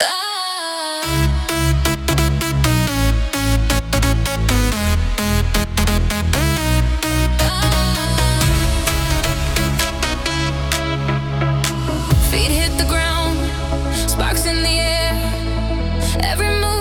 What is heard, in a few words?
Dance Floor